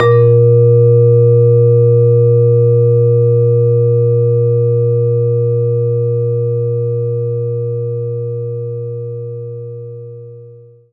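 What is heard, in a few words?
Vibraphone A#2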